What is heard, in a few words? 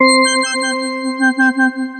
Synthesizer Middle C Notes, Lead